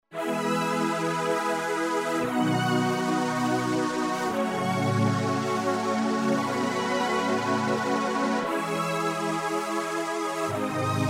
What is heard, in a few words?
padz, yamaha tg500